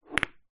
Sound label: household aftershave or perfume glass bottles x2 slide into each other on wooden; zapsplat